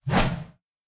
swing axe 2 hand

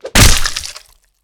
kill chop

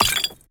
potion up; skyrim

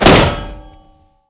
shield heavy bash

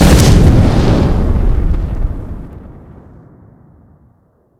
shout fire breath

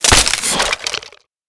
skeleton death

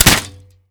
crossbow fire

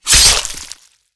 kill slash

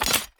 weapon up; skyrim

